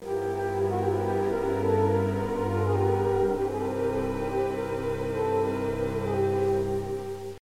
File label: music; noir